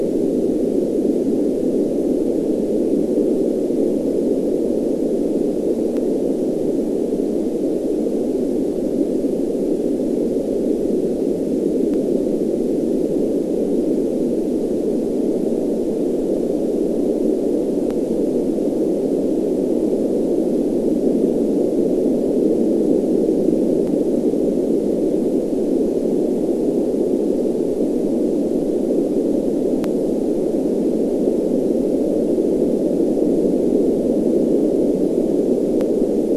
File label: WFR audio emfisis